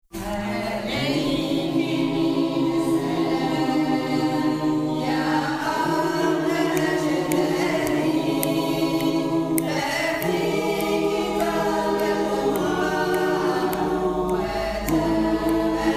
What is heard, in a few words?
trio; Alaiki Mini Salam; houou